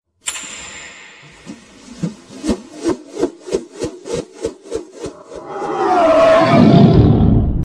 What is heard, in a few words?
air tune sms ringtone